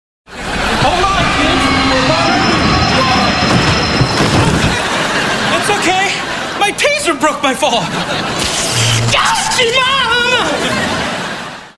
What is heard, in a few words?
taser